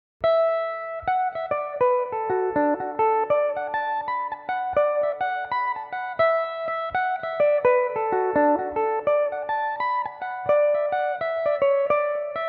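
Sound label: D major belmuse, irish mandolin; Belmuse EM-190 electric mandolin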